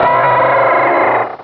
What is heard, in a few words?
cry; source of the sovereign